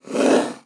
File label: Hard Slide Short